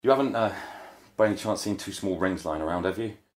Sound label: small ring